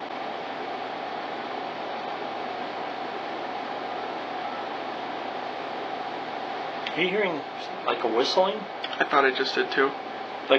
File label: whistle at 4 seconds; EVP